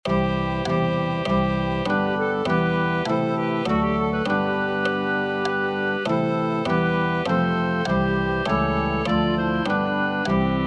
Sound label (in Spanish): clarinet1